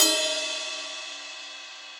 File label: Ride Bell Ambient Loud Punch; Drums; Drum Hits; Standard Hits; Ableton; Session Drums Studio